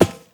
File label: Step Metal Plate